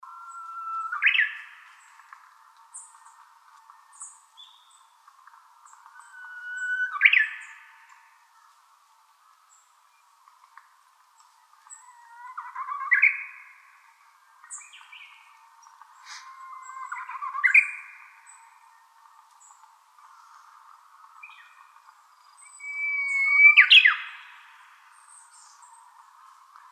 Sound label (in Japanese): uguisu